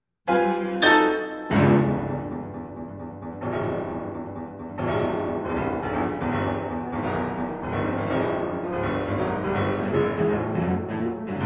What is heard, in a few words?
cello sonata